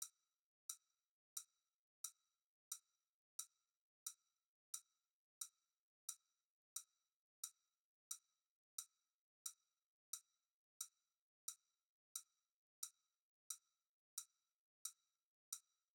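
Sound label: Drum hat; 13 Festival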